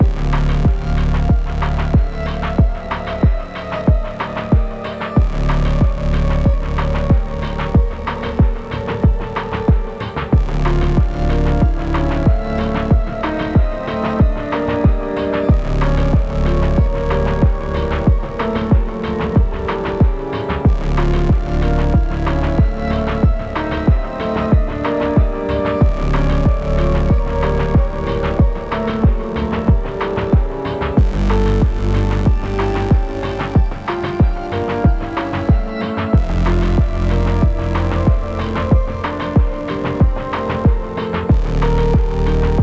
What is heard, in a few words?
BGM field